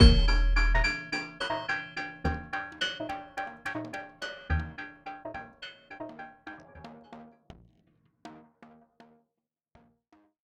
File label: Music Hideout; Sifu